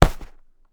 Cardboard Box Small Dropped Onto Soft Surface Punch Hit Mono; Zoomh4n; Nt5